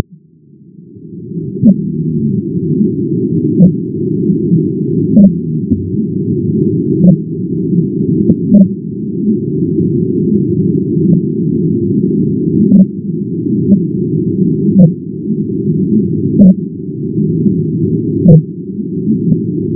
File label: Fin whale 10x